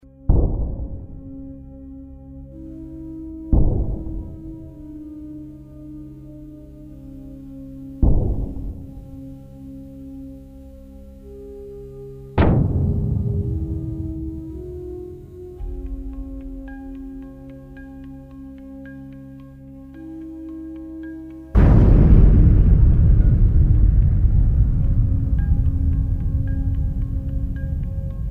Cannon far away

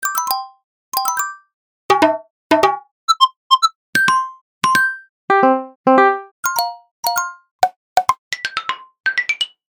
Confirmations, organic ui building game sfx; APPlicable Sounds

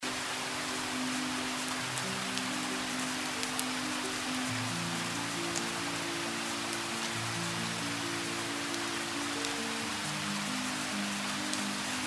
Light Rain; realistic atmo; realistic atmo pack

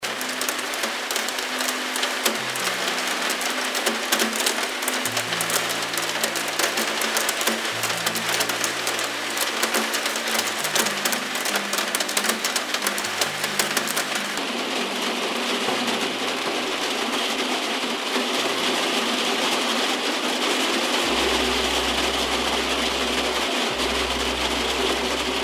Rain Against Window; realistic atmo; realistic atmo pack

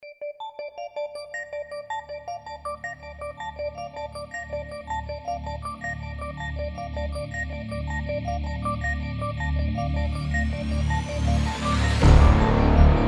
epic music; epic music pack vol 2

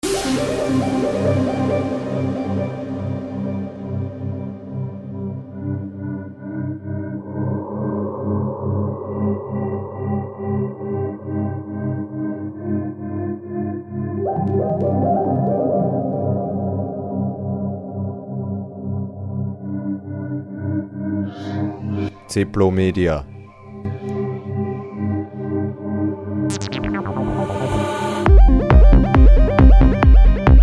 blackrain, hard electronic music

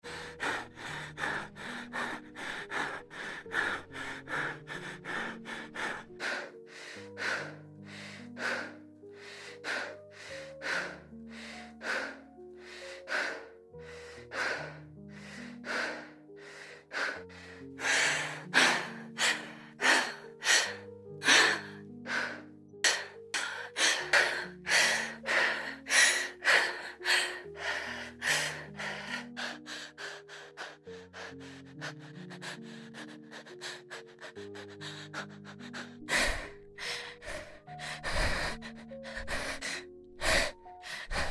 Human SFX Body; human sfx pack

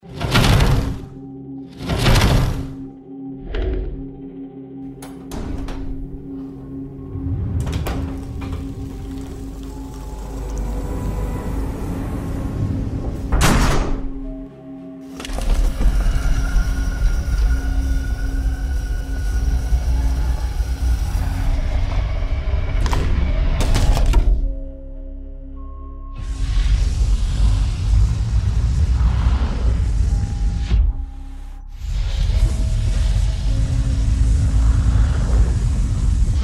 Doors, Machine Sound FX

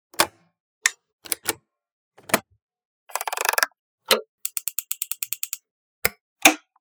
Switches, organic ui game sfx; APPlicable Sounds; building

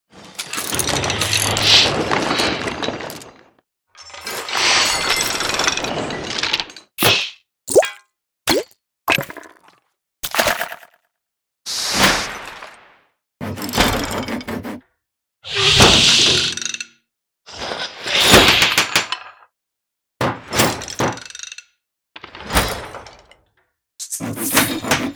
Construction and Movement, organic ui game sfx; APPlicable Sounds; building

